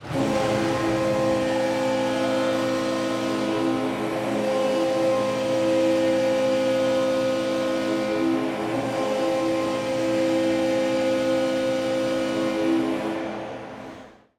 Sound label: horn